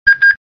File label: register scan